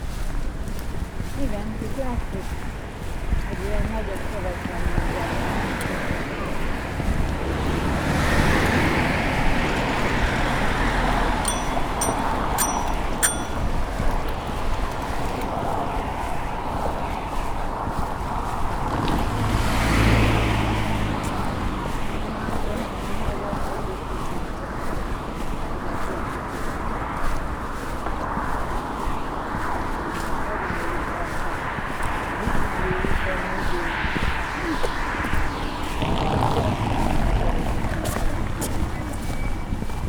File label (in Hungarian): folyóparti sétány biciklicsengő